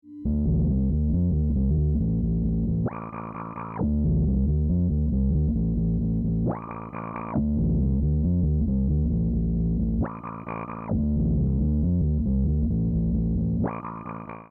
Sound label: wahoo my